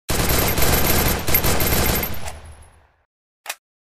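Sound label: MP 40 shooting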